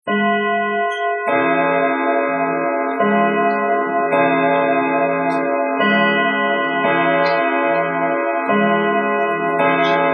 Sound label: Bells